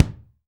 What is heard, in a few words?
BD, DR BD; Best Service Gigapack 2